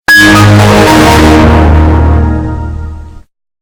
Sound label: Windows XP Shutdown Earrape